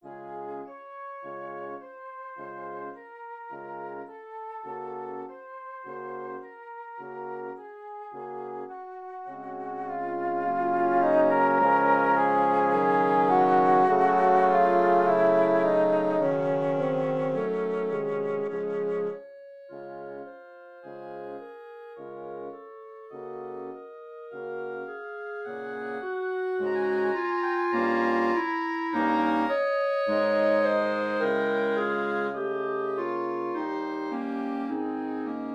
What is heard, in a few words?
midi; Silent Woods